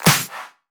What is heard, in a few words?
Snare; Vengeance Samples